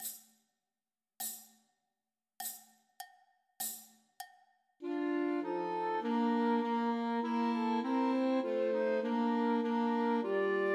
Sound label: Once in Royal Davids City Christmas Trios for Flute Clarinet and Alto Saxophone